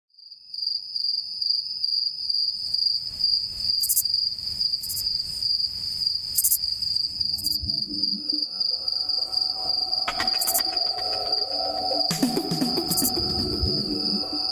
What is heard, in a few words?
Tropical Mystery